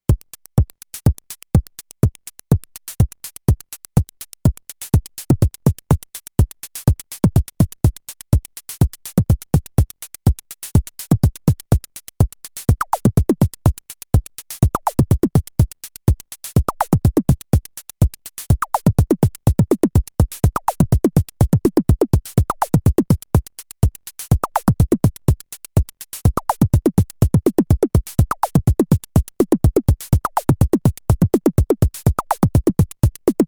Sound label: BD HH; DarkEnergy; Nanozwerg